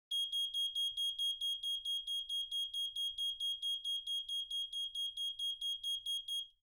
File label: Alarm